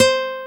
Note C5 Akus; Best Service Gigapack 2

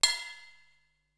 Ride Cymbal, Ensoniq SQ-R Plus

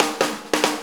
Shuffle Fill, Drum Tools

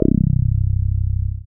dance bass; Sound & Vision - Gigapack II CD 1

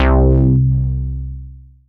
FROG BASS; Masterbits; Dance Trax

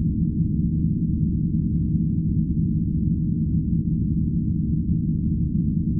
InfiniVerb Voice, sparse soundscape Loops

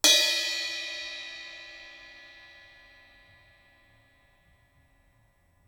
Crash Bell, drums singles; The Roots Drumloops

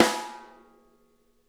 SNARE EDG; Sonic Reality; Interactive Drum Kits

